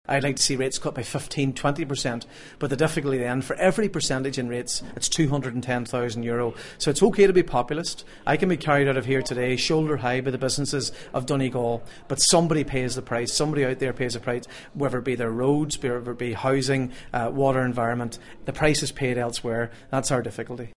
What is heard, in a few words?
pad